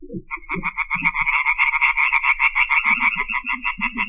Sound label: Frogs In Pond During Storm; gcompris; memory activity